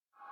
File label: ambience